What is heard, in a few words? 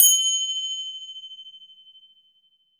FINGER CYM A; AKAI S-Series CD-ROM Sound Library VOL-5